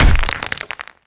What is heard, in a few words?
Rock Shatter Impact